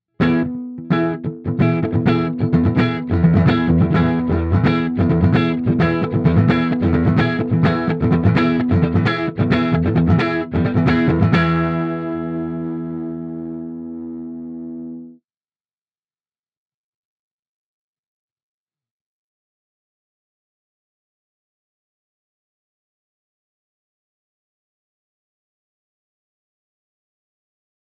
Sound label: superbass; mercuriall spark test